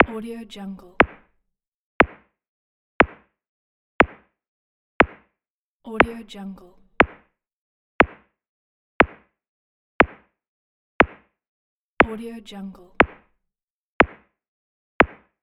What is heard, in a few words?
countdown